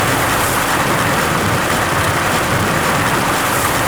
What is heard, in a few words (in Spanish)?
EXT DERRAPE GRAVA; AOS F1 2022